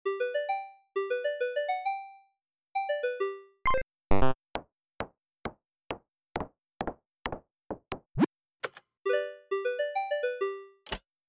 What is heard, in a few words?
chess console sounds